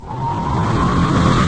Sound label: throw powerup; hedgewars